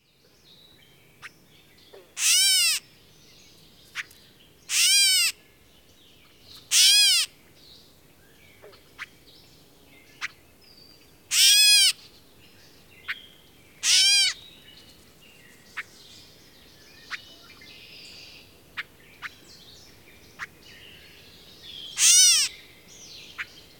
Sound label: graycat